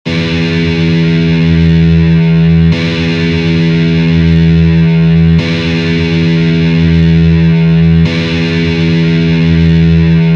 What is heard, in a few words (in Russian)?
struna Zvuki strun elektrogitary